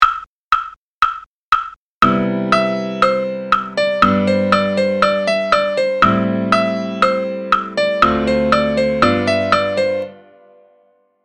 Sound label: chord 7th